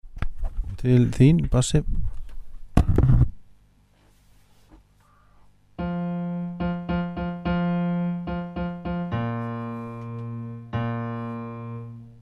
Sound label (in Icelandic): bassi